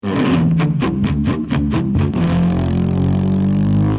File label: krinkle slide